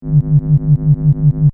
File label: Space fx loop, Spaceship Environment Style Loop, Short; Sci-Fi; Stuart Duffield